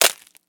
crack eggs

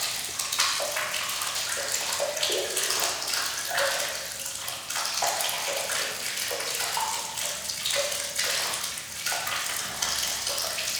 sewer

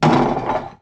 metal tool rolling

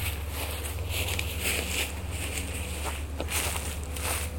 dry leaves walking

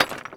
stone drop crack

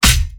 axe hits shield

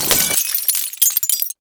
glass debris

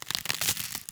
ice cracking

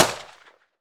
handgun outside shot

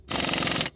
cash count machine rev

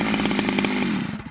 chainsaw stop

